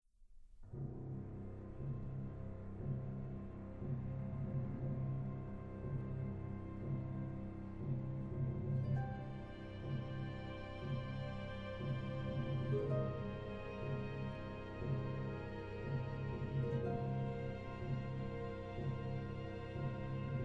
Concerto for Trumpet and Chamber Orchestra, Largo, Alla Marci